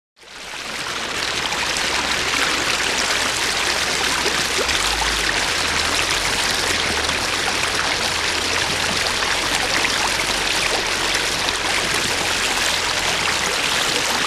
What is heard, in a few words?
Stream Medium Fast, streams